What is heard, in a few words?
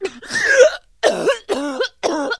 gasp